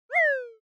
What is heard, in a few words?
Motion Slide Low